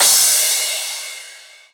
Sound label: Crash, Cymbals